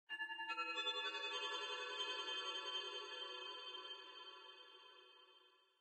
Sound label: Amb Spacerace Glockenspiel